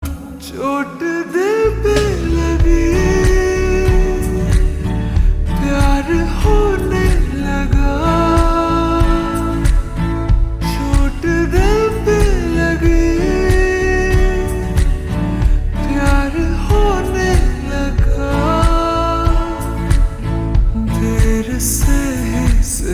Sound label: Ringtone slowed and reverb